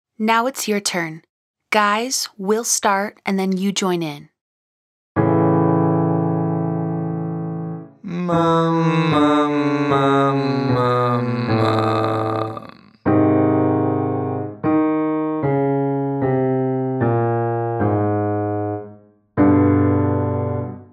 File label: Mum to Vocal Fry 5 Note Scale Male Version